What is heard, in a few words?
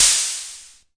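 mattel hh, Mattel Synsonics drum kit, lo fi; 8 BIT KITS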